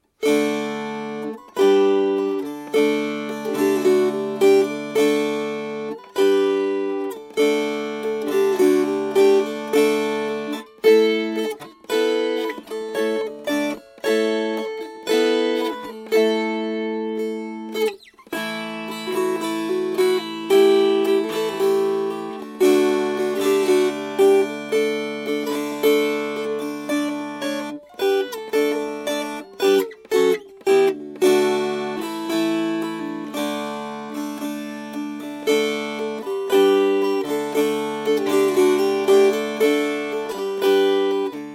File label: Strummed